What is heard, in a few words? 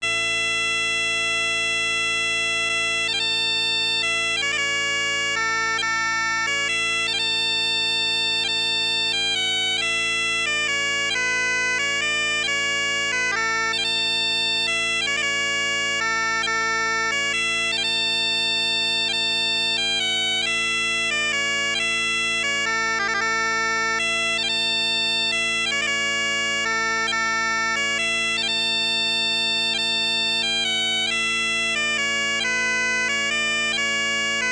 slow; Steam Boat